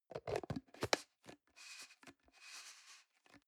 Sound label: plastic can small lid screw close soft